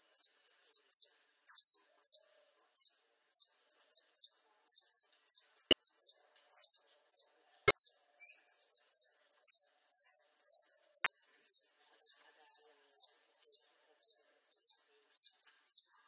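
Gursky's Spectral Tarsier, This vocalisation was recorded at night close to the roosting tree of a group of tarsier in lowland forest.; Tarsius spectrumgurskyae; Tangkoko National Park, Sulawesi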